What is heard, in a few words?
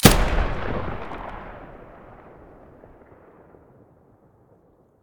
muzzle sa80 stereo